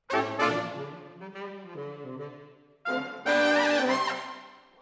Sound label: Horns Over